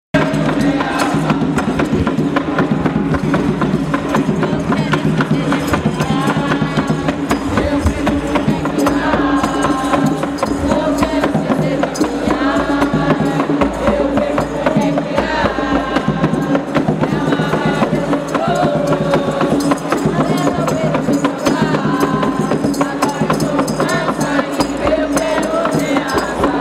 batuque de umbigada, Piracicaba; passarinho

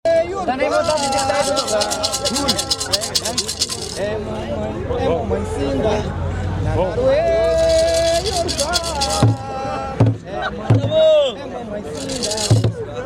jongo de guaratingueta, jongo

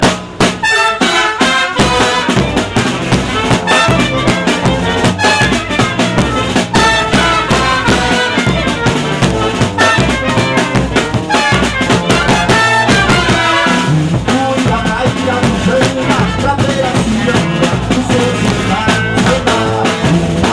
frevo e ciranda carnaval

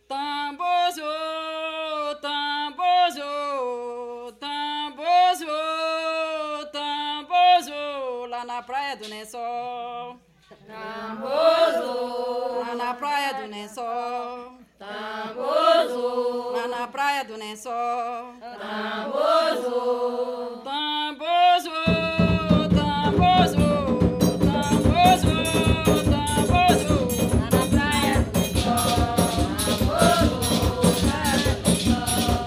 tambor; tenda sao jose

